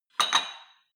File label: weight rack insert weight multiple short